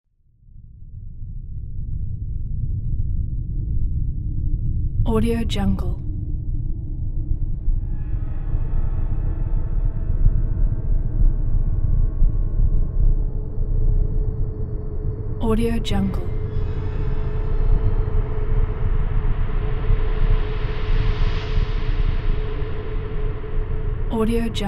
paranormal activity atmosphere

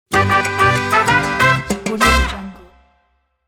latin musical mexican transition

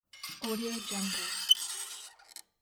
very squeaky door handle slow press

